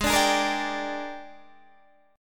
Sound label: A sharp 13th